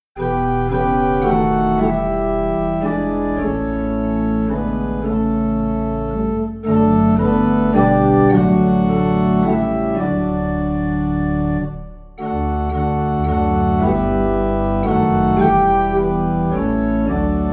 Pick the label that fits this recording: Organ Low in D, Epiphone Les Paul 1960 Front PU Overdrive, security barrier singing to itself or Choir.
Organ Low in D